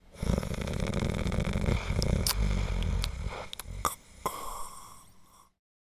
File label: Deep heavy snoring short